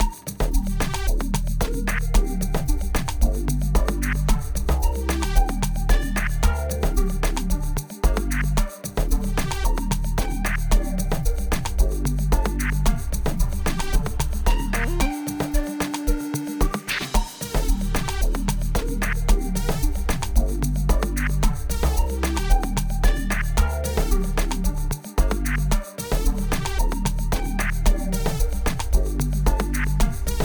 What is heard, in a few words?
G minor 112 BPM; Ranta Vula Vala